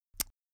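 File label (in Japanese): 少女「キス」チュッ1回; 元気な少女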